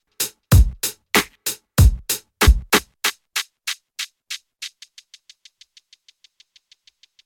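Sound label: echo Break Delay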